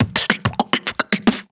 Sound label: Beat Box Drum Fill; Aawaz